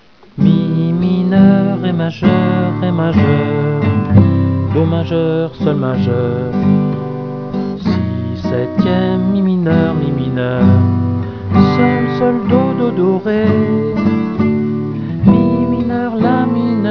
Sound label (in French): MEXICAIN, guitare